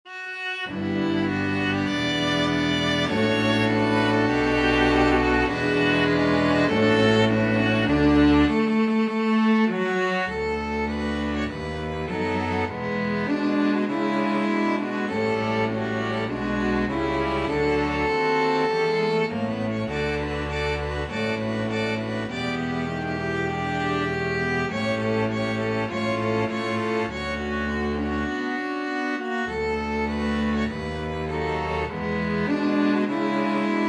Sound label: string ensemble